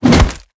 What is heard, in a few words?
Fight Attack Hammer; Mighty Party; Android